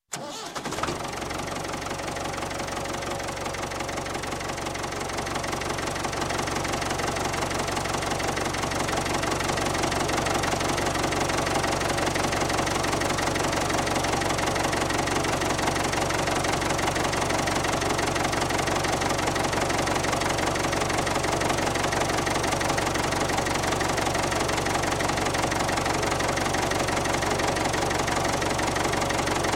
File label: Tractor, Diesel Start, Idle, Stop, Industry; Farm; Sound Ideas 2000 Series